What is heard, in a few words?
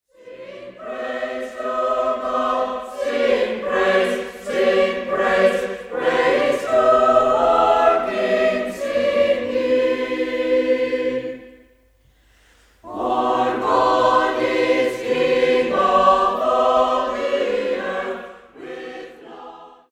Psalm, Scottish Psalter; Scarborough; 1650